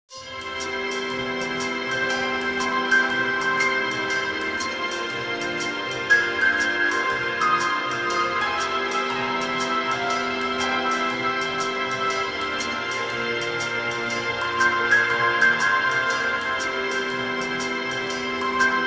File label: Tension - muzak; Judge Dredd: Dredd vs. Death